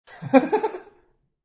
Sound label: Wierd Mal Lagh, Weirdo laugh.; Weird Male Laugh; Laughter; Sound Effects:Voice